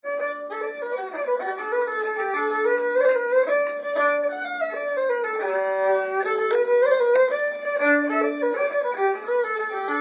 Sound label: slides; Danny Abs nos 1 and 2